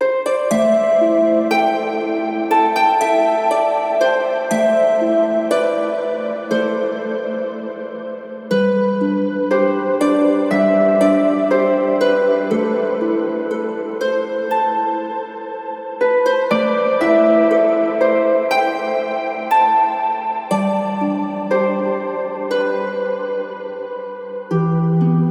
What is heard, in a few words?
Stringed Instruments - Harp - Am 60Bpm; Ghosthack; SkyRealm